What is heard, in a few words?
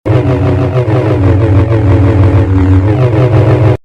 lightsaber spin